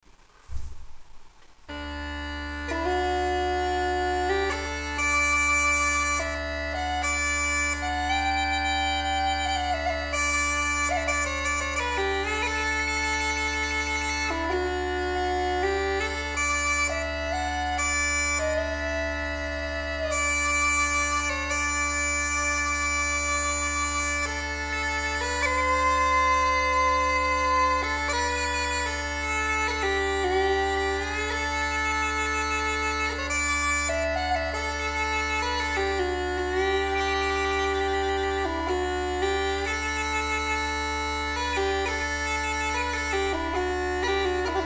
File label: maple halfset slow, uilleann